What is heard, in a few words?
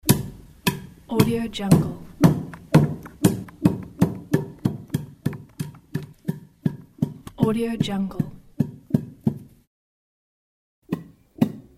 pounding with wooden mortar sounds; soundall